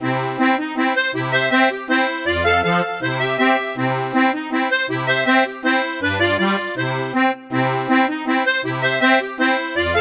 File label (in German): steirische harmonika styria